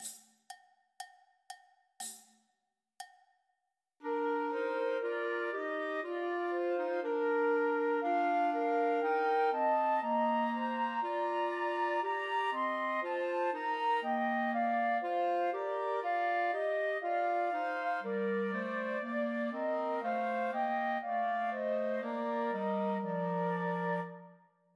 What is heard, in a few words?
Unto Us a Boy is Born, Christmas Trios for Two Flutes and Clarinet